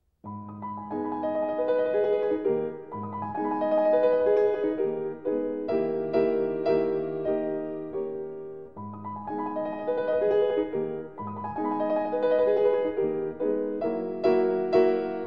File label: Impromptu in A flat major; Schubert; Op. 90 No. 4; lifestyle